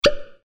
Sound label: receive